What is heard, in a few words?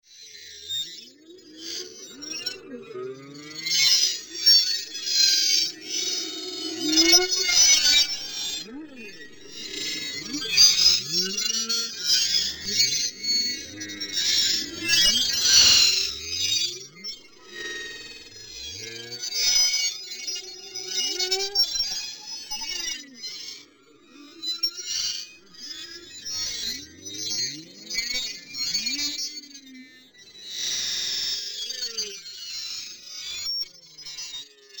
Another Galaxy Ambient Flanger, science fiction sound effect